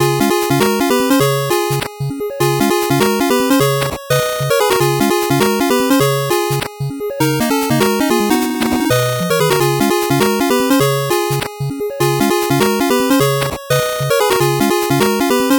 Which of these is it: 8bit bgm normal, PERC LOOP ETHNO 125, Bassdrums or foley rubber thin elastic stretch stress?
8bit bgm normal